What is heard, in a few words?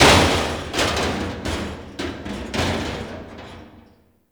Destruction Metal Falling; Ambience; Warframe; Corpus Railjack